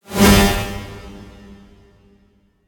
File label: Titan, Trailer Whooshes; Federico Soler Fernández; Effective Trailer Whooshes